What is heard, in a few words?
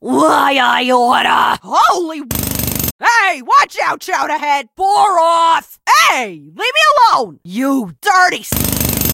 hurt